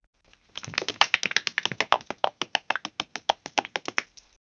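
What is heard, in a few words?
thuds of hoofs fading away, horse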